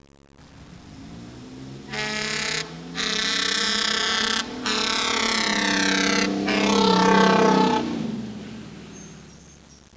horn, fire; disaster

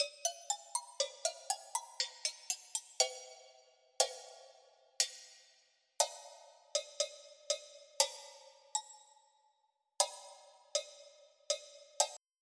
Charanga Bell

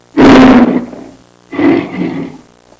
lion roaring; jungle animals; Sound Effects for Multimedia - Volume 1

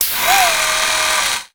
ship wing close; Earth and Beyond; EA Games

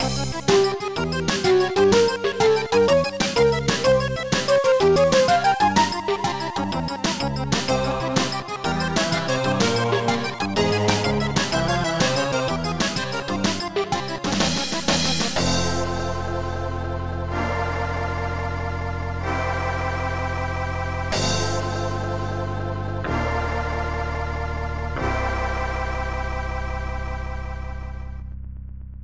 Arabian Intro Seq; M Furnis

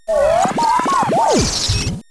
Static; Treasure Galaxy (1994); Learning Company

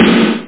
snar; killing

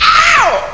Fall Yell; Arashi 1.1

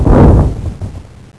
Fireball; Death Blade

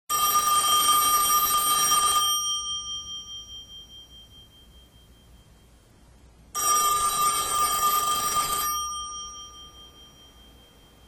ring; WMBonusXP

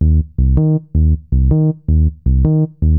SNTH BASS AMBNT 160 C; Ambient

